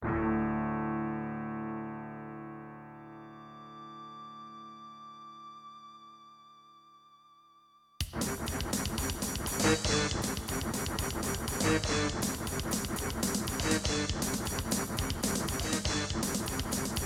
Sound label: sb awe32 ct3990; Creative SoundBlaster AWE32 ct3990 (external player GM emu); soundcard test; Doom